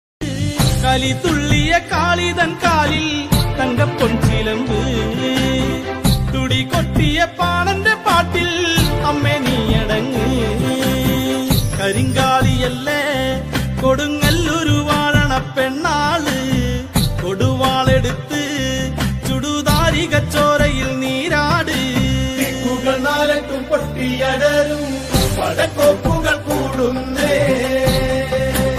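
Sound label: malayalam bgm love; fahadh faasil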